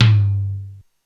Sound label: LoTom, Korg DSS-1